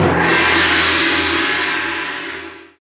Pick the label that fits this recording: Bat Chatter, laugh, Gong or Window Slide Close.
Gong